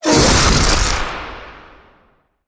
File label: Mammoth Crunch Impact